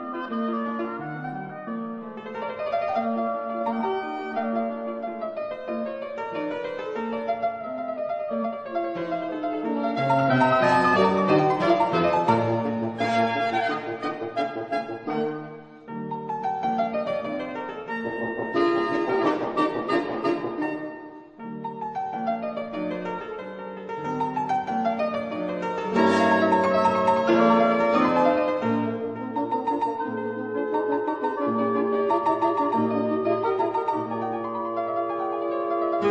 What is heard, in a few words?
Larghetto, quintets for piano and winds; wiener klassik